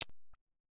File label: jump; big tower tiny square